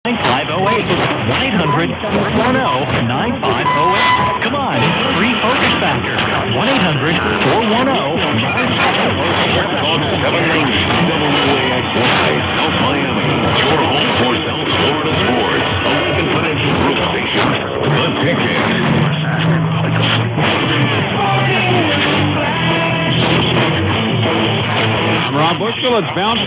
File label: 790, mw dxing; waxy